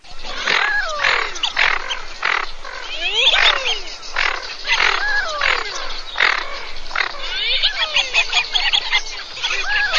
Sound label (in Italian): MORIGLIONI